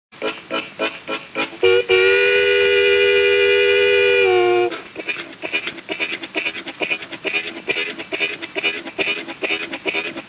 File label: harmonica